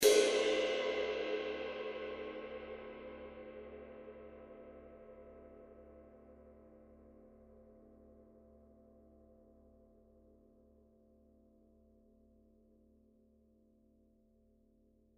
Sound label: 22in Custom High Definition Ride